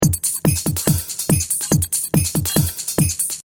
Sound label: Techno-Trance Drum Loop 142 BPM; Drum Loops; Lynne Music